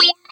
gweeup; Truth-Hz - 1-Hit KO Sample Pack

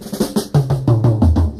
BREAK, Fills; Drum Kit; Crowdsourced Sample Pack; SEMIHAUTOMATIC BREAK

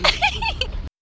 STUPID LAUGH; TRUTH-HZ; Crowdsourced Sample Pack

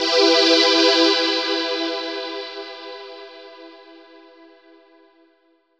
Classic Chord; One-Shot Synths; Crowdsourced Sample Pack; TRUTH-HZ

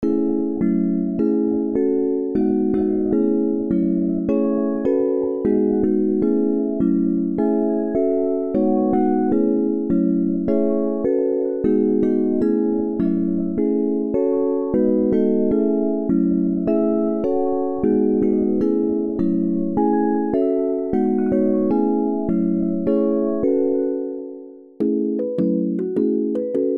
melody, Instrumental Loops; Crowdsourced Sample Pack; TRUTH-HZ

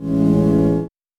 hoooua; Truth-Hz - 1-Hit KO Sample Pack

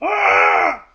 AAAAAAH; Sound FX; Crowdsourced Sample Pack

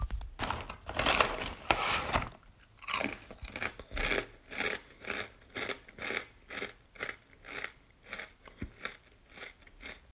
eating chips, Foley; Crowdsourced Sample Pack; TRUTH-HZ

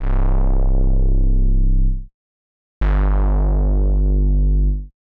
bass; TRUTH-HZ; Crowdsourced Sample Pack

